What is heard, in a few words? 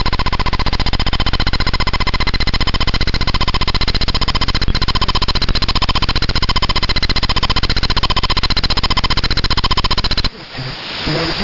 Radar; numbers-stations